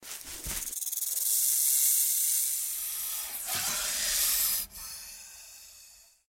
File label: Brown Weeds; Blind Quest - The Frost Demon